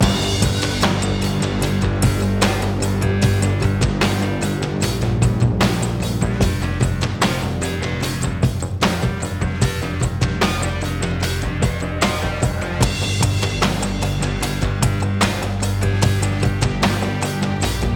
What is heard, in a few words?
Music Perc Pre Race 75bpm; Pm Perc Pre Race 2 75bpm V2 Game Mix